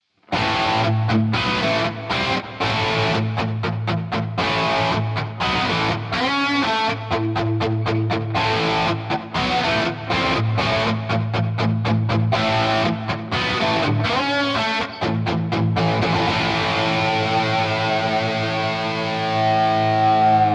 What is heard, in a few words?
Martial Double Track; Audified ampLion 2